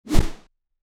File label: an unarmed swing sound; game sounds